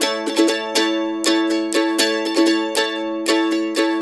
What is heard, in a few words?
RIFF, Blues Guitar Samples